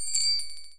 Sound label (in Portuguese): Sound cristal